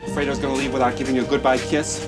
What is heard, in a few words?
goodbye kiss; starwars